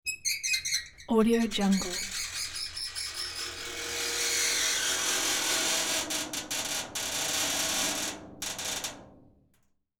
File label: old metal door creaking with long squeak; hollywoodedge